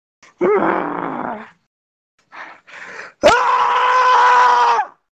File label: ahhhhhh